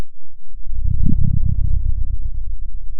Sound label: Sound of a dinosaur